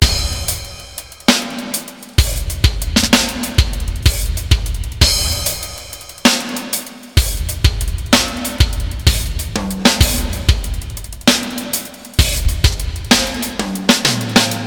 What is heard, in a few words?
verb test